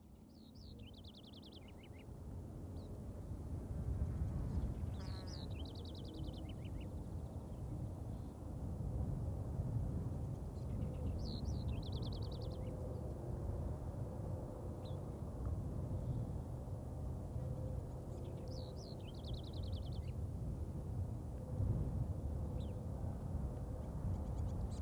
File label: Mining Tnt Explosion From About 2 Km Distance